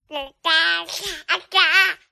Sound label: vox 10 month baby babble excited talking